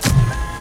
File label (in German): HYDRAU; Best Service; Advanced Mega Effects